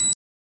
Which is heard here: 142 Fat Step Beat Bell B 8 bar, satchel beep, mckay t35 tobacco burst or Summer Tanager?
satchel beep